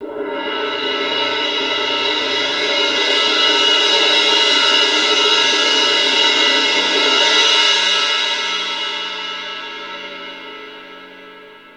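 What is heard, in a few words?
C18 TR P, orchestra tremolo; Best Service; Peter Siedlaczek's Advanced Orchestra; C18 TRMOL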